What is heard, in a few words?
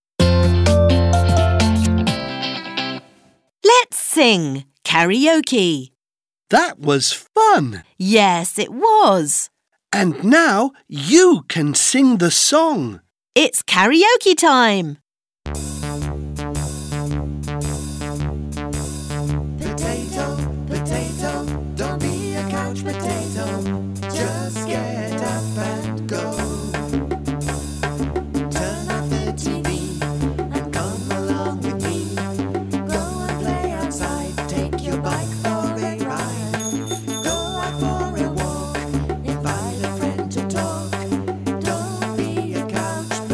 Karaoke